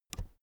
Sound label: keyboard external space push